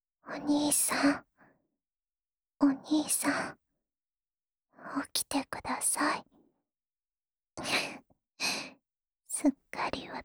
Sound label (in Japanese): 安眠ボイス＆寝息(左), ASMR